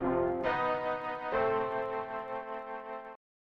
Unknown Jingle; Turn and Burn: No-Fly Zone; SNES

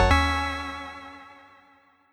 Key Pickup Db

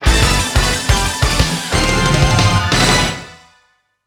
Stage Clear; Street Fighter EX Plus Alpha

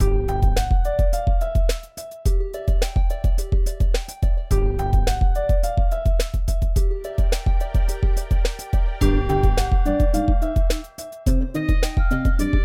Main Music; Plants vs. Zombies

